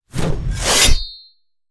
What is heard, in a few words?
sword whoosh chop no gore; soundmorph